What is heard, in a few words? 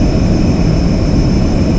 hov idle, hover